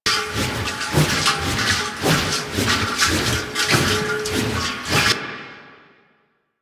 Oil Canister Loop; Warframe; Vehicles; NechroMech; Abilities

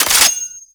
Sniper Low Clip Mech; DE; Warframe; Warframes; Frumentarius; ExaltedSniper

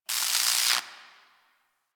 Destruction Electricity Sparks; Warframe; Corpus Railjack

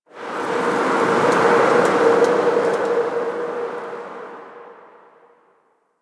Exterior Forest Wind; Warframe; Ambience; Duviri

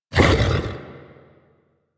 Melee Grunt B; Enemies; Vocals; Warframe; The New War; Archon; Amar

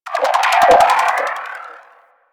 Electronic Gust; Warframe; Corpus Railjack; Ambience

